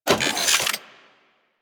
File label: Turret Closet Open; Ambience; Warframe; Corpus Ship Remaster